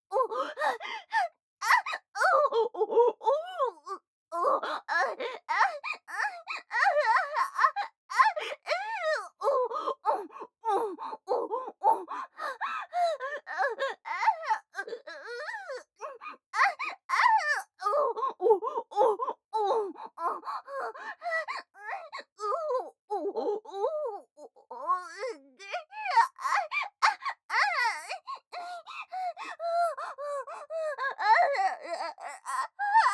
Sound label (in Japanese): 喘ぎ声・大きめ